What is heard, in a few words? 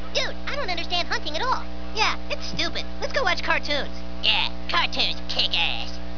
cartoons; south park